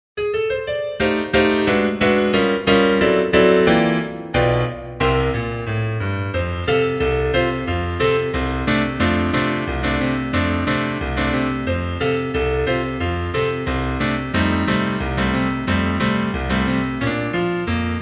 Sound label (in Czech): pouze piano